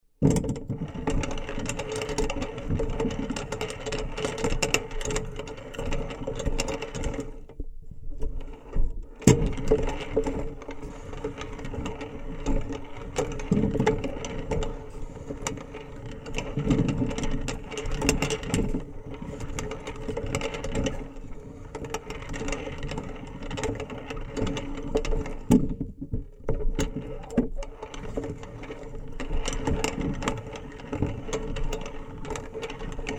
apple crusher contact mics

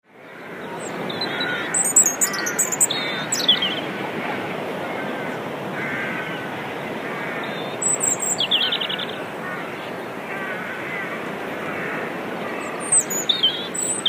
over beer beach

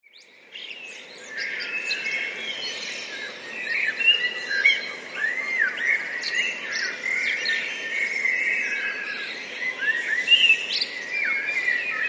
blackbirds

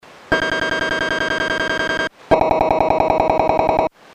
scanner button

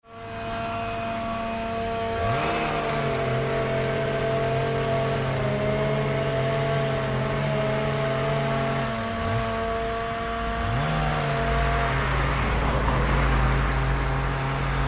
chainsaws; rushmere

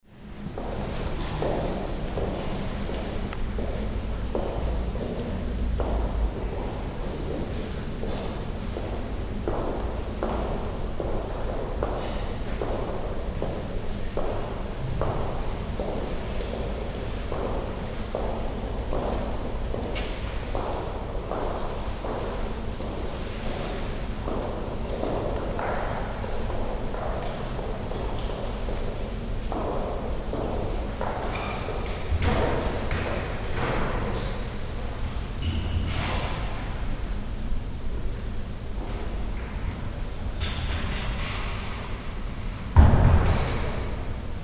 great hall

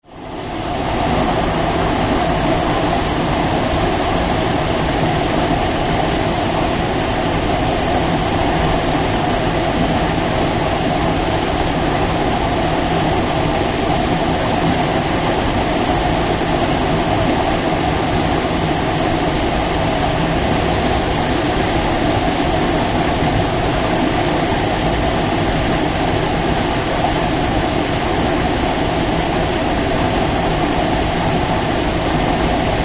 whitchurch town mill

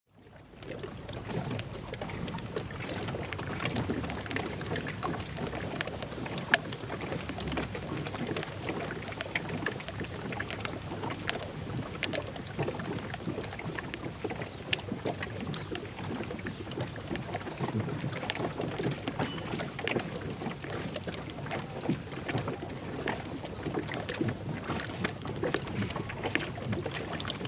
thorpeness boats

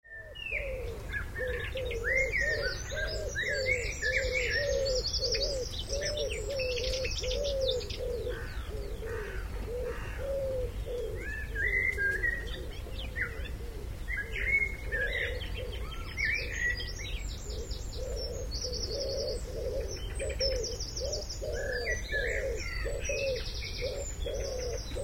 dawn birds

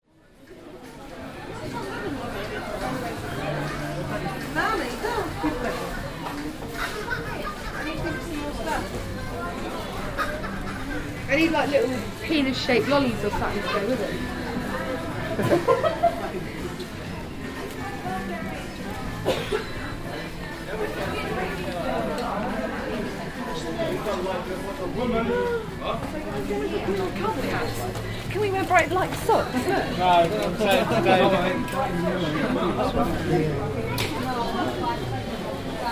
poundland

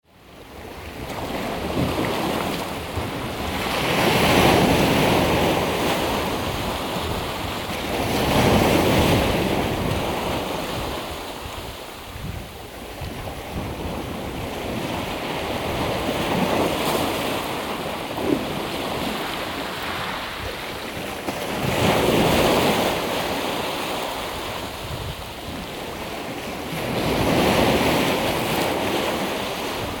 hopton waves

